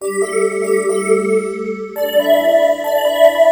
Synt; SMOOTH

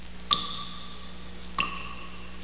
bas

